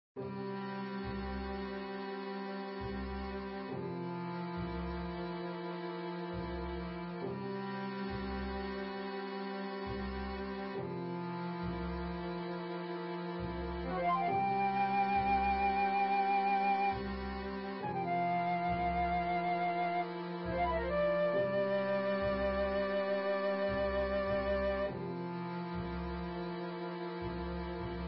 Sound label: Strange atmosphere